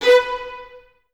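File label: Violins B 4 staccato left; Miroslav Vitous; String Ensembles; 11 Violins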